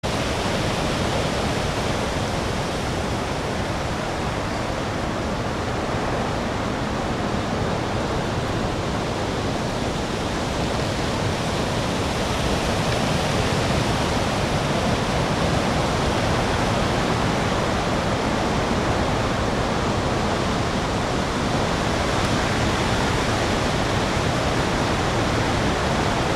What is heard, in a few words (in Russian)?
veter, zvuki